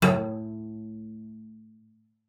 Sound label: CELLO FINGER A2; Xsample VOL-5